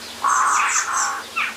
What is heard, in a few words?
Birds Various